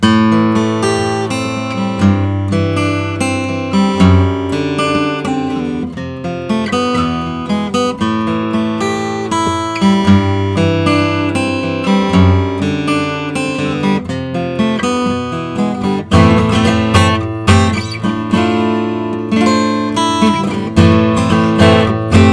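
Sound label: style 1 adirondack natural; r taylor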